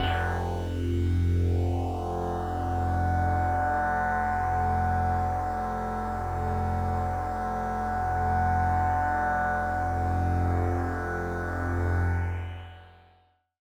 One Shot Drone F, dystopian drone; One-Shot Drones

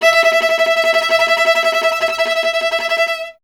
viola E; Miroslav Vitous; Solo Instruments VOL-1

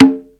MID CONGA; E-MU Formula 4000 Series Vol. 1; Hip Hop Nation

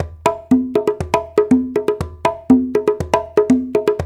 120 AFRO; Spectrasonics; Supreme Beats; African Contemporary

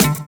LE BASSIS; Univers Sons

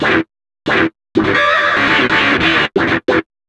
GTR, Chemical Beats

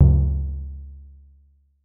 Drum X FX, Pearl

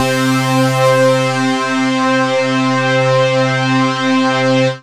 Synths Note C

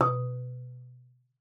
MARM B2, MARIMBA; Xsample VOL-4

